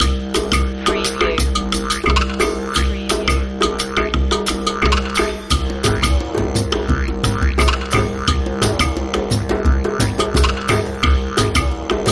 outback groove loop

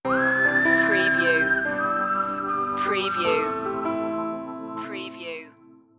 the whistle song stinger

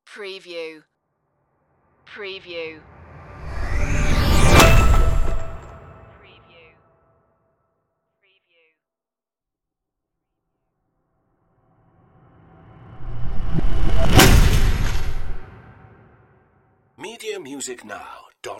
heavy whoosh impacts

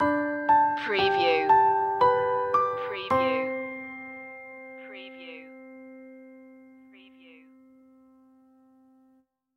look at the stars stinger

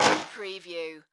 Impact Hard Electrical Reverb

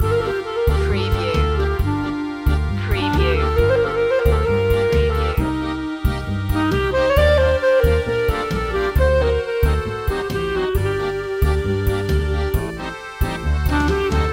tango francaise loop

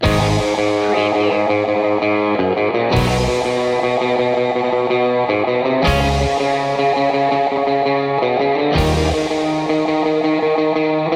surf

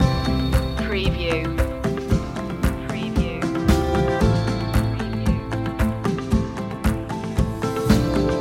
turn that frown upside down loop

